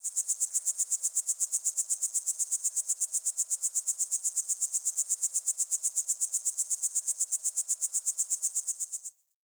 Tree Critters; Warframe; Eidolon Plains; Ambience

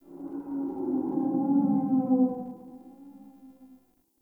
Exterior Distant Calls Happy Calm, Ambience; Warframe; Duviri

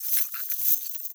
Bird Body Shake; Ambience; Bird Three; Warframe; Entrati

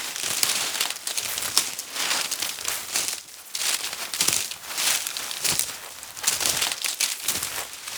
Wall Climb Movement Step Vegetation Loop; Warframe; Oraxia

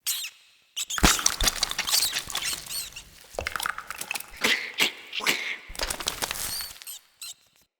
Acorn Cleanse Rat; Cinematics; Bromius; Bromius Quest Acorn Cleanse

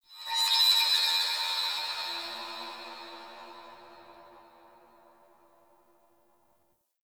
Sentient Harmonic Cries; Warframe; Tau

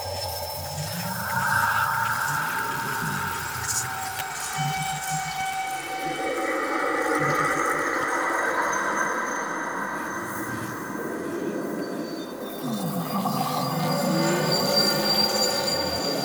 Amb Loop, Interior; Warframe; The New War; Zariman

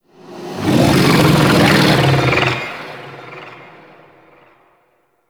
Vocal Patrol; Enemies; Vocals; Warframe; Teralyst

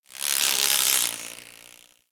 Forest Close Insect Buzz Fly By; Warframe; Grineer

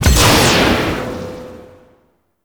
Spear Throw Impact; Warframe; Corpus; Spear Gun; BE Ferrox

